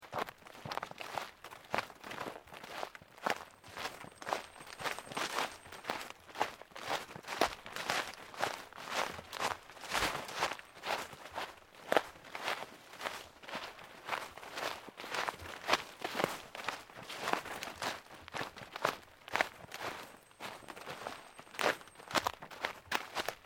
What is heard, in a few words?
FOOTSTEPS SNEAKER LEAVES DEEP SEQUENCE LOOP; Footstep Foley; Human